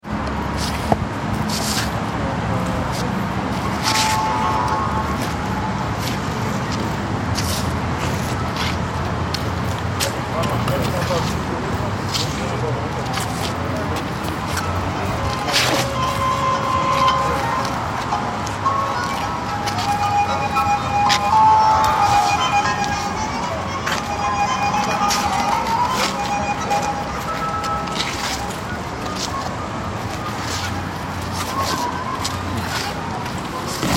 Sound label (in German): klang musikhochschule